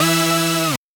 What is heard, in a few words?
synth